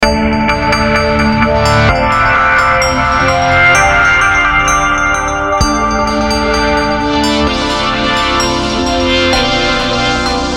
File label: Violin